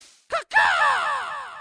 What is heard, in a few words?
ka ka crow